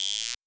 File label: Guiro Long, Wersi WM 24 drums